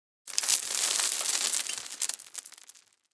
Debris Dust Dirt; blindside

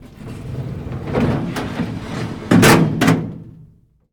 Morgue Drawer Close; Medical; Blastwave

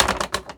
Toilet Paper Dispenser, Bathroom; 1001 Sound Effects

